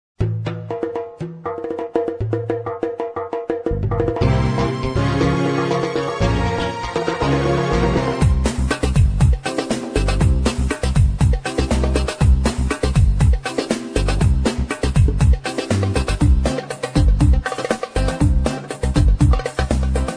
SAIDI, korg; pa80

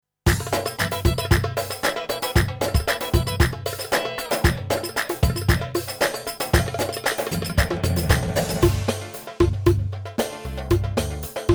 Saidi; Stage

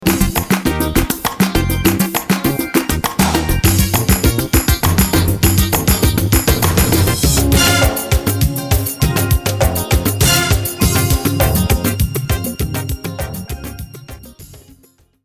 IRANI, Styles

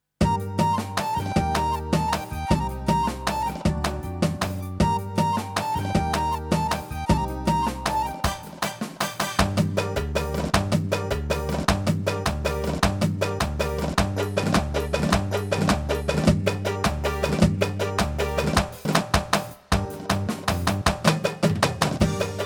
Ghita; Demo Styles

